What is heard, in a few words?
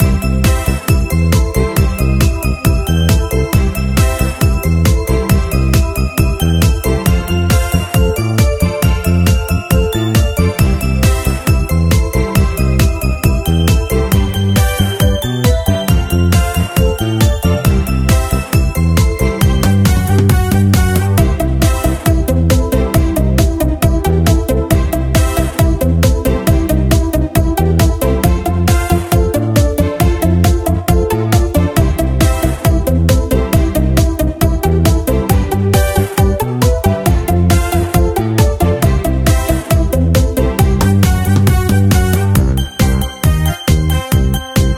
stage BGM